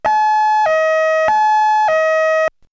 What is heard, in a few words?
Siren; X-Static Goldmine